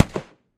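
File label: muffled pistol shot in the distance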